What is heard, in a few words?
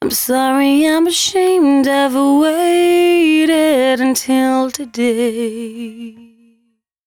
113bpm C#, Velvet Vocal; Zero-G